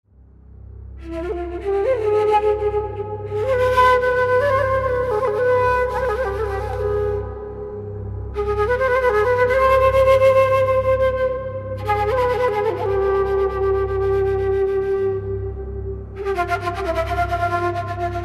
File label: Virtuoso Middle East Flute